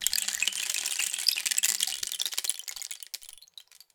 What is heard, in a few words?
Rain Stick, Percussion Samples